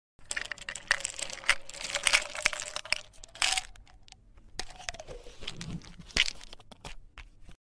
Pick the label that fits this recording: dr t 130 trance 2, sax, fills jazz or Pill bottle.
Pill bottle